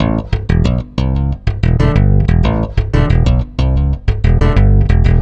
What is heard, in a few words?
Stick Loop, Stick Bass